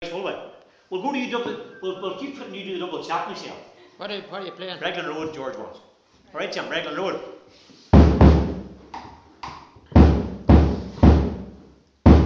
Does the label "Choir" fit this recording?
No